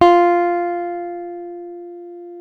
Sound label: F 4 JAZZ GTR; Univers Sons; Jazzistic